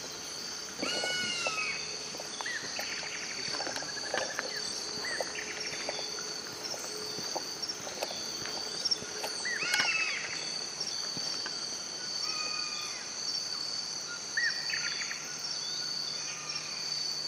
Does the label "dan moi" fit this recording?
No